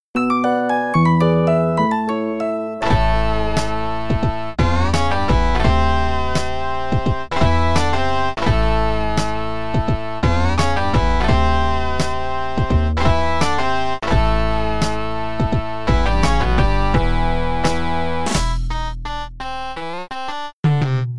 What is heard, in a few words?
nokia ringtone; better call saul